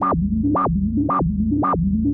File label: drum pack circuit efx variations